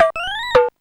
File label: chip flip, Sonix Music Driver